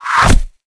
arrow hit ground; Mount&Blade Warband

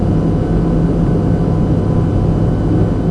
undercity loop, ambient atmosphere; Garry's Mod; hl2

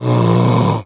contaminator die; Emperor - Battle for Dune

